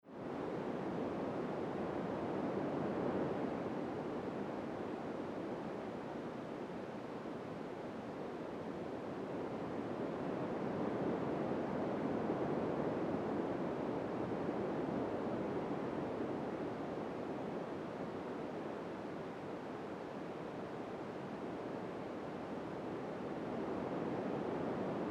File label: wind